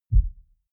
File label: sub bass soft